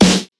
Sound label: Snare Acustic